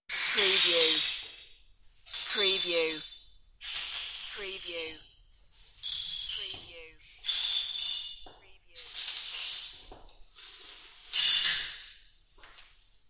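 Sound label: Short Sweeping Glass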